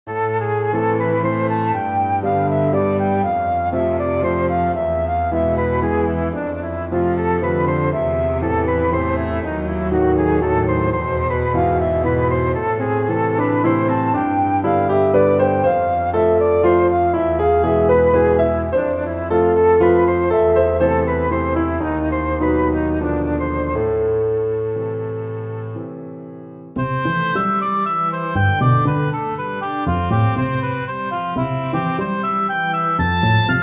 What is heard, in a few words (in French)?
valse pour ensemble